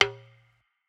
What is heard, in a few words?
perc; eddyflux